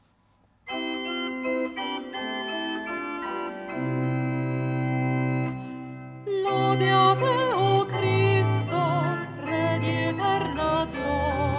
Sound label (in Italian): Acclamazione Domenica Palme Benedizione